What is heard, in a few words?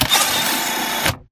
Mvmt Servo Head pivot Long; AI Stalker; Titanfall 2